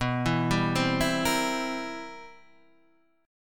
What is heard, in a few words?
C 7th B, C7/B chord; arpeggiated; JGuitar